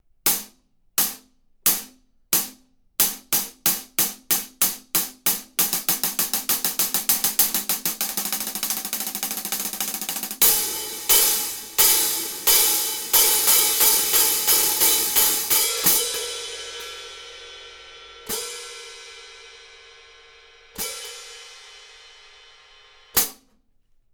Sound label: 15in Classic Regular Hats